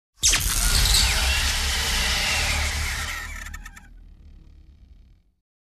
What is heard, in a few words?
Long Electrical Discharge